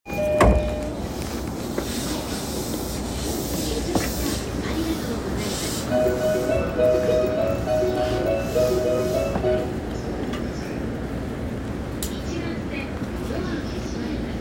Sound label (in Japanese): JR中央線快速日野西, train sounds